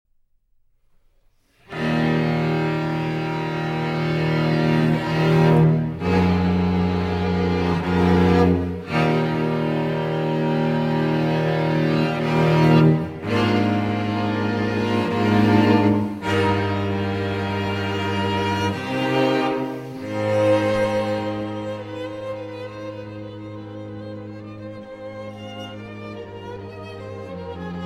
String Quartet No. 12 in E-Flat Major, Maestoso - Allegro; Beethoven; Op. 127